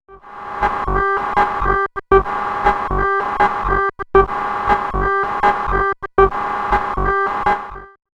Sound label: SOMA ROAT Machine Groove